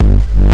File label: dark distorted bass hit; Basses